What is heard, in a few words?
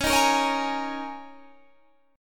G sharp Suspended 2nd Suspended 4th C sharp, guitar